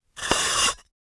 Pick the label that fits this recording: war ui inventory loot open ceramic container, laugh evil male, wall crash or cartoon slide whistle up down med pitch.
war ui inventory loot open ceramic container